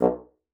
bassoon, B1; Xsample VOL-6

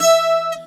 CG HARD E4 R, Celtic Flavours; USB Soundscan vol.42; AKAI

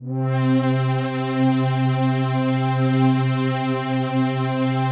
PAD, DIGI-PADS

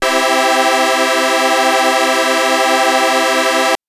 Synth PulsePad maj c3; TrashLibrary

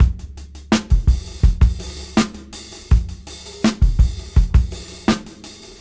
Slider 82 BPM; AMG - Keith LeBlanc Phat Butt Jams

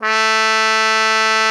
cup A3, cup mute; Xsample VOL-3

